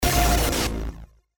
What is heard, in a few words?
Blast Digital